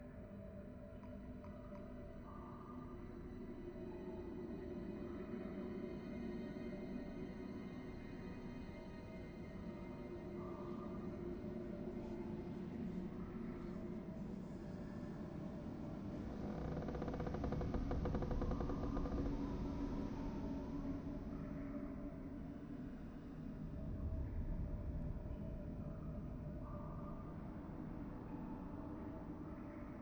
Env dungeon

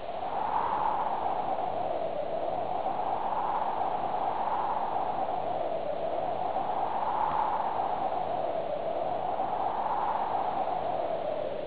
Env desert

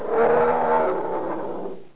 hurt Dragon

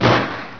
pet fire

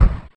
puff

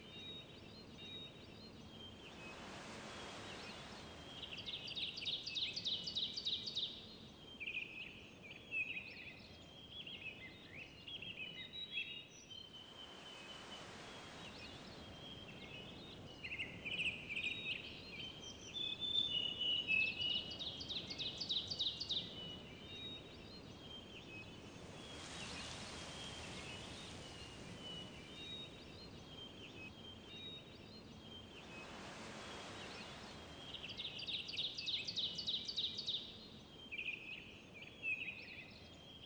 Env forest

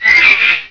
pet call

